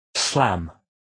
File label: slam